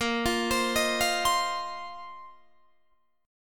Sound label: Bb Suspended 2nd Suspended 4th Bb down Guitar Open D